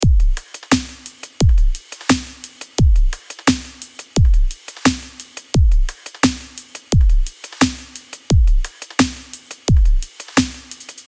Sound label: Snare Dry; Sknote; Soundbrigade